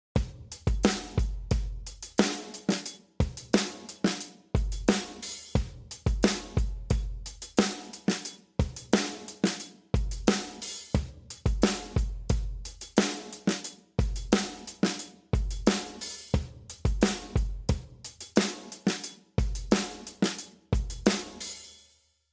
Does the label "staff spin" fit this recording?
No